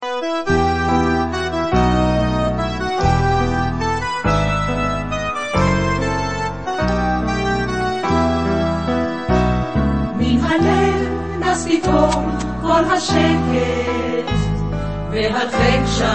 Instrumental

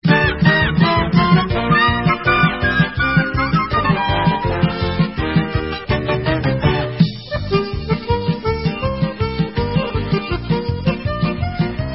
Hora, Instrumental

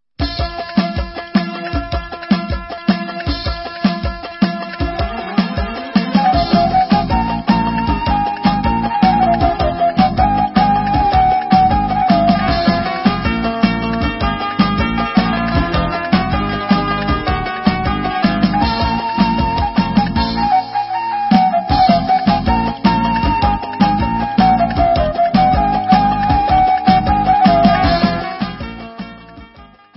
Debka Instrumental; Debka Eti

